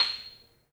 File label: MARIMBA, C; 3D Sound; 3D Keys VOL-1